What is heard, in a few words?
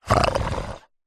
horror monster creature single snarl grunt growl nasal